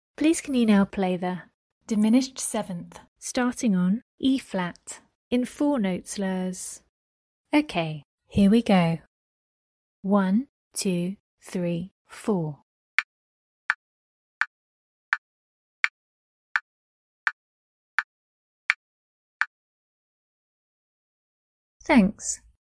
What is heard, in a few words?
dim 7th Starting on E flat 4 note slurs